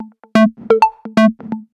Mail, notifications; Cyan; Wiko; Cing Peax

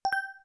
Multi Menu, Keytone sounds; KM570 Cookie Gig; Piano; LG

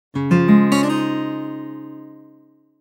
Acoustic Guitar, notifications; Tecno Spark 40C

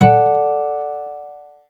Harmonics, notifications; G3 D855; LG

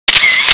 camera shutter; i-mobile; 510

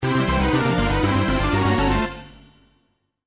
New Message

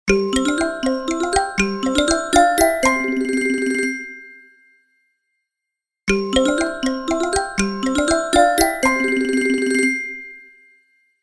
Small Bell; Tecno Spark 40C; alarms

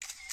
camera click

